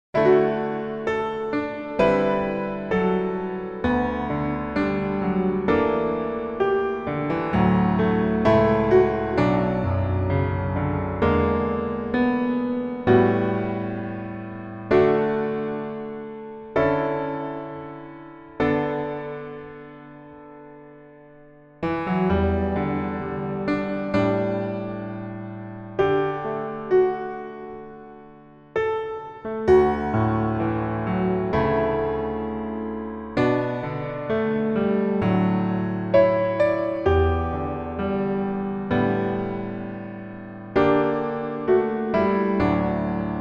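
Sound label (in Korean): Piano version 남자키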